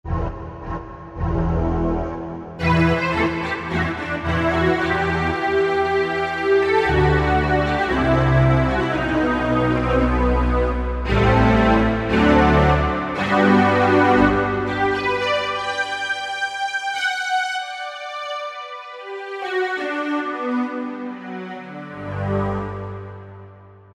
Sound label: Symphonic, Korg M1; Factory preset examples